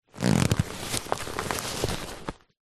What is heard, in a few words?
handbag leather rigid handle short